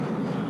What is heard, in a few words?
jetpack